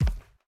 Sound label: Step Wood; Game rip; MiSide